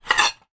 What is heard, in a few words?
plate dish remove from draining rack between others, household